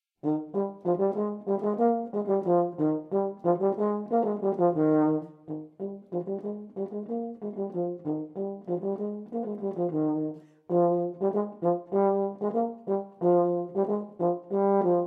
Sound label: Euphonium Etude